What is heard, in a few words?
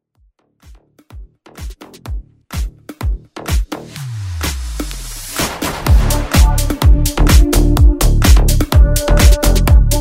Low Pitch Remix